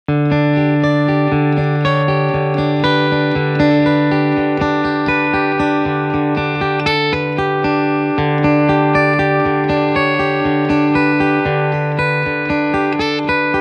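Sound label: Piezo Picking, guitar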